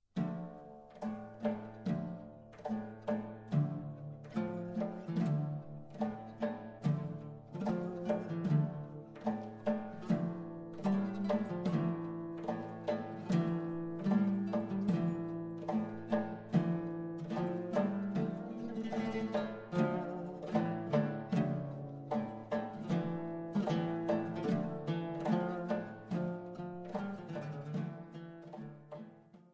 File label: Arabian Dance Instrumental